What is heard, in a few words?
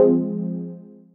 Fm Dub Chord